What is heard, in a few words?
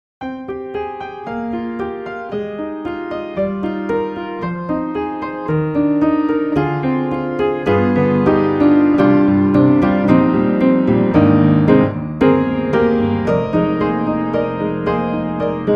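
Upright Mono, piano; Spectrasonics; Keyscape; Wing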